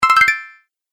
Bonus Collect, video game sound effects

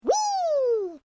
Created Creature Small Call, Alien Sound Effects

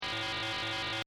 Scifi SFX Loop, Short; Sci-Fi (Sound Effects:Science Fiction)

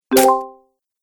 Loot Coin, video game

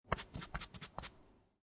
Billiard Pool Snooker Apply Chalk To Tip Of Cue

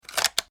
Click Mechanical Weapon Clip Latch

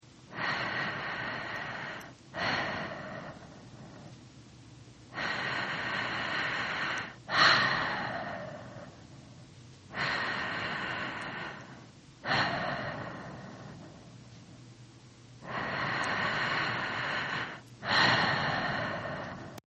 Breath Female; Breathing; Exhaling; Sound Effects:Voice; Partners In Rhyme

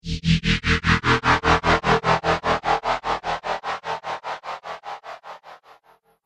Pulsating Laser Sound, Laser Gun Sound Effects

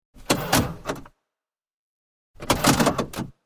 Sound of inside of car's central locking; Vehicles

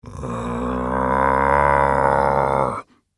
Frankenstein, Monster, Groan; Horror; Monster Sound Effects; BlastWave

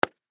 Lacrosse Impact, Lacrosse Impact Forearm Pad Hit; Sports; Blastwave

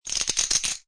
Poker Chips Stacking Chips In Hand; Gambling; Casinos

